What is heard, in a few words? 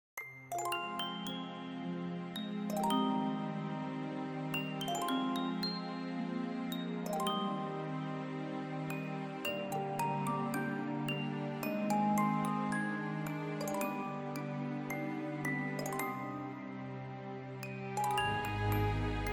lullaby; poppys lullaby